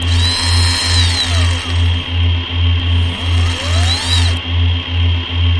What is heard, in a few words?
surveillance; vendetta